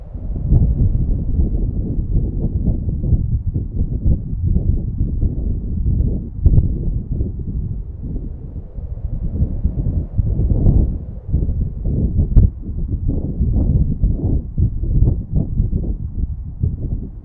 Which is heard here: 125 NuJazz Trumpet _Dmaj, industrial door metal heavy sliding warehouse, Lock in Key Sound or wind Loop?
wind Loop